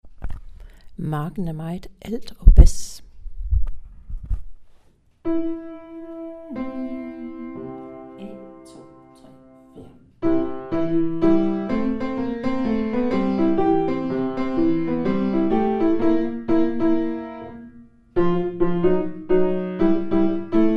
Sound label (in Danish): Alt og bas